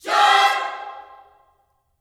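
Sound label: JOY C major, Vocal Gospel; Spectrasonics; Vocal Planet Vol.1 Gospel; akai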